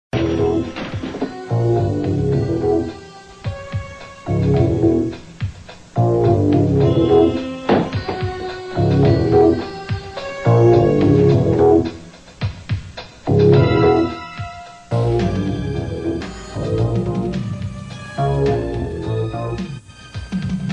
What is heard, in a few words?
suspense; Peter Flint